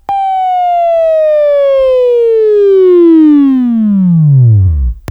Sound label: Buzz; Labview-Program; Sweeping Training program